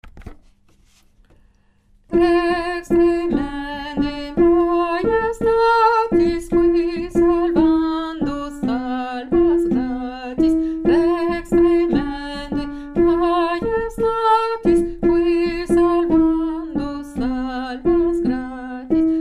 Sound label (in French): lettre ABCDEF alto; chorale